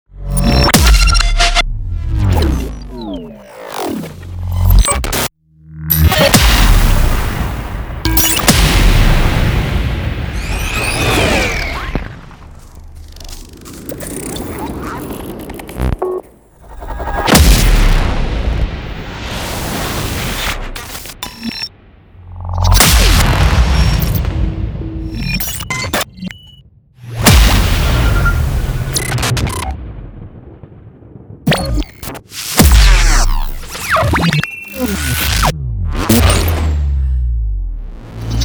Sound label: Sci Fi Mech Devastation and GUI Design; Joe Edwards